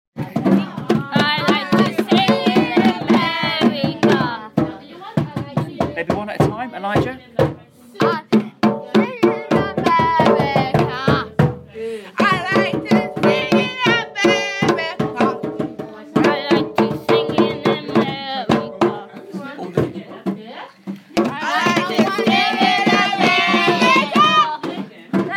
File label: musical theatre; year 7